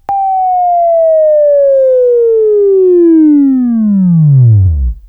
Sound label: WAV Left, Sweeping; Sweeping Training program